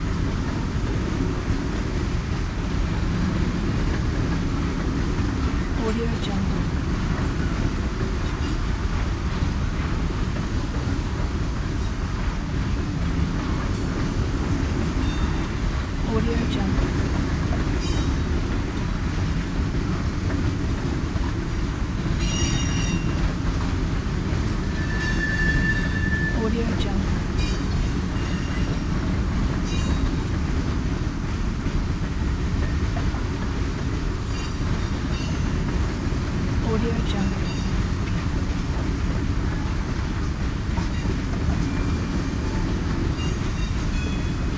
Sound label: train ambiance